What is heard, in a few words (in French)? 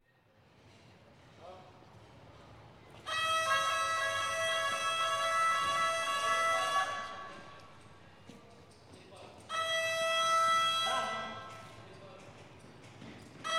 Alarme de sapeurs-pompiers